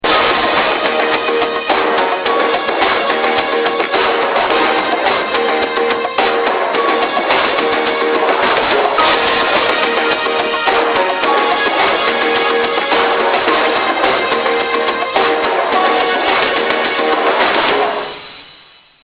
Tune Down 2 Business; Blackberry Bold

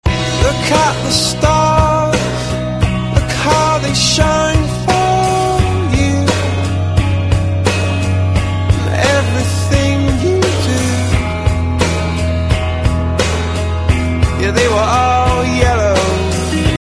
ringtone; Blackberry

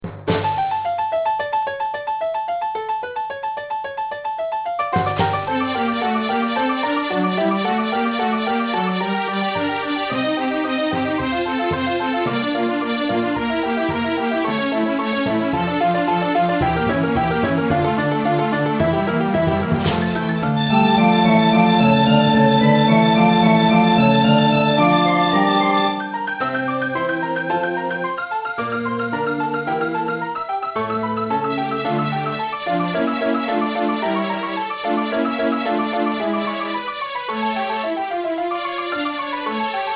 Tune Bach Fugue D Minor; Blackberry Bold

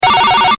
Ringer; Blackberry Bold

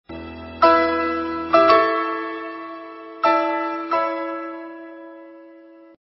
ringtone; halo text; Blackberry

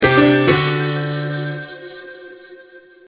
Ringtone; Blackberry Bold 74

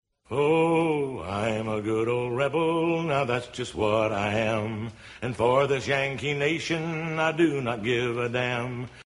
anthem ringtone; Blackberry

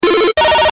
Ringer; Blackberry Bold

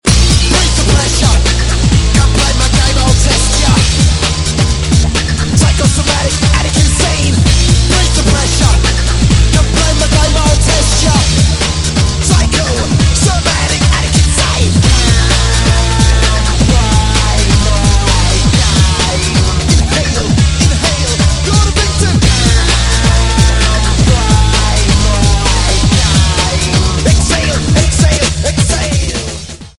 breathe lyrical ringtone; Blackberry

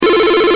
Ringer; Blackberry Bold

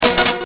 Notifier Caffeine; Blackberry Bold

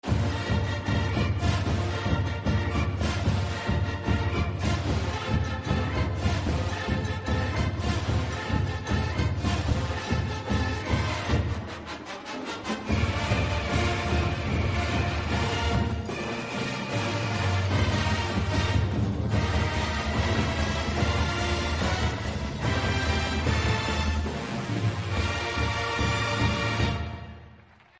ringtone; Blackberry

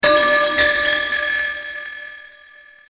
Relaxed Soar; Blackberry Bold

